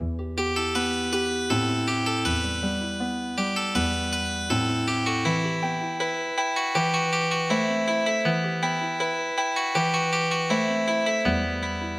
Healing Harp, midi traditional

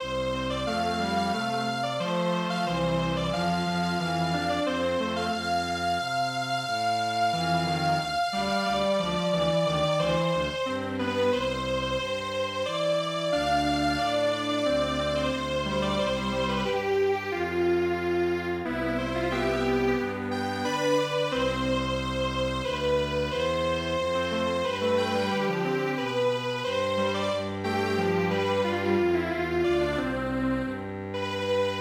Strings Quartet, traditional; Toshin Doi

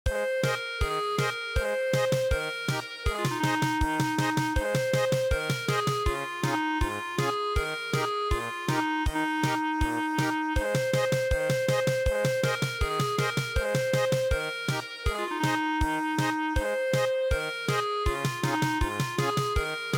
German Polka, traditional

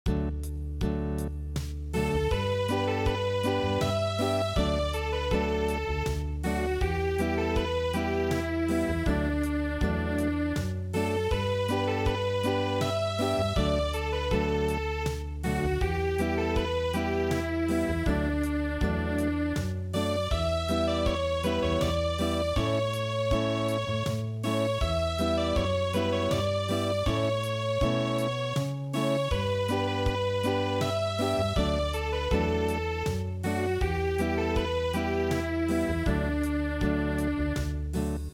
Acoustic, midi; Schlaf ein mein Blond-Engelein

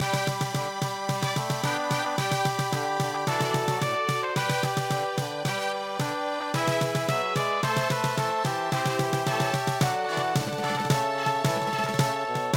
brass band march; midi; traditional

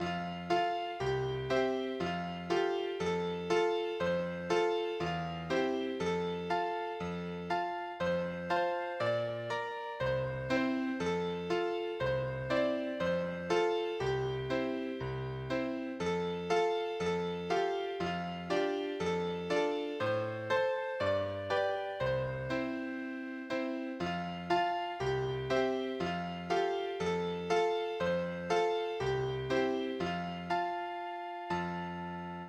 Ragtime, midi; Ningyo

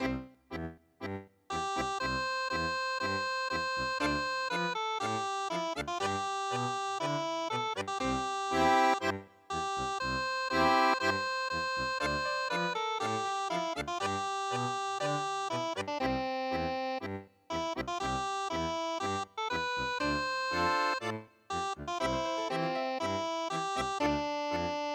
Tango

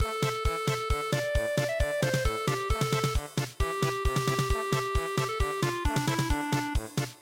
polka; midi; traditional

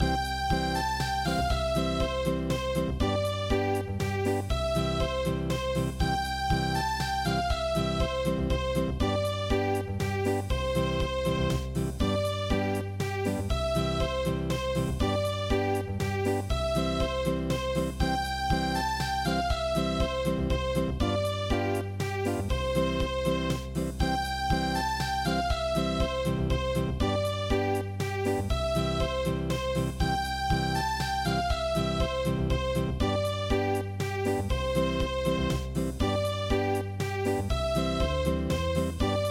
Unplugged, midi

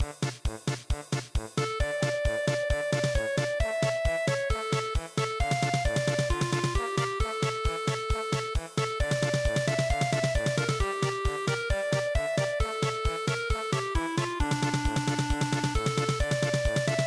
polka

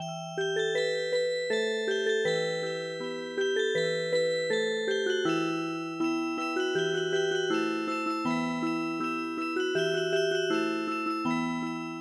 Music Box, midi traditional

